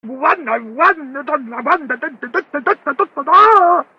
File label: Tatatata Ringtone